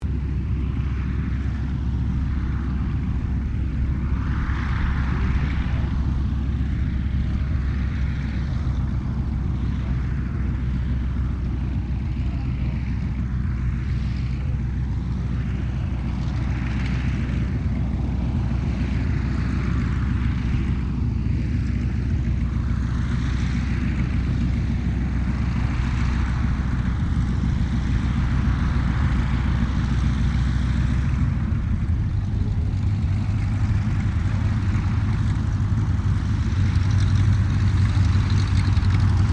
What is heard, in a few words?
AIRCRAFT CAC WIRRAWAY TAXI STEREO